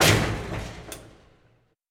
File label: Pilon High Impact